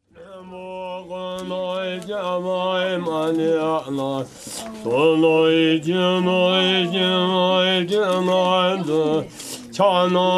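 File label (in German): Lhasa Merunyingba Mönch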